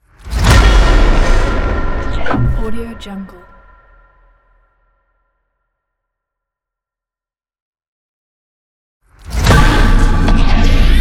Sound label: horror glitch impact